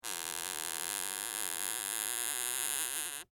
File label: Door Squeak Wood, Foley, Door, Squeak, Wood; Prison Doors (Sound Effects:Doors)